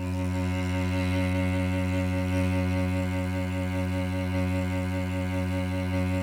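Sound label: chamber cello; Sonic Reality; Vintage Timetraveler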